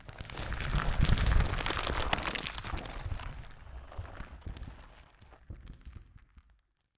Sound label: falling rubble